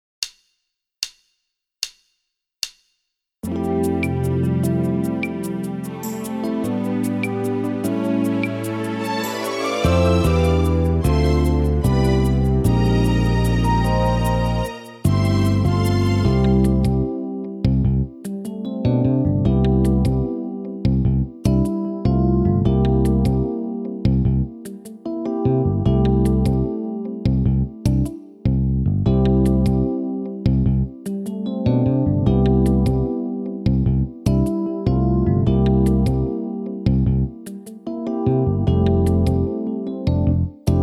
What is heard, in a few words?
Backing Pop Ballad